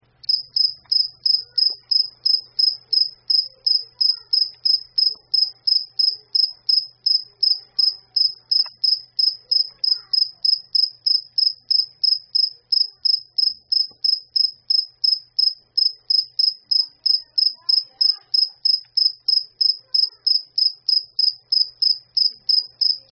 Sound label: gryllus bimaculatus, grillo campestre; Gryllus campestris L.